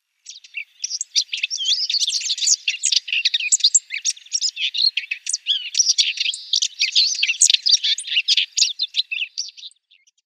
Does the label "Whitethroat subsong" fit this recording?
Yes